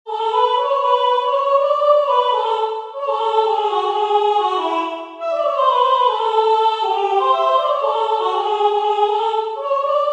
entonacion piano Voice; Só voz; Entoación con acompañamento

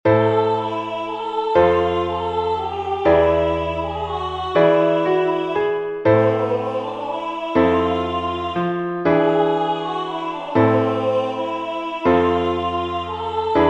entonacion piano; Entoación con acompañamento; Melodía e acompañamento

